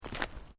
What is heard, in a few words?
Footsteps Boots Road Gravel Step